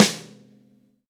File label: V-Pop Snare St, Roland BK-5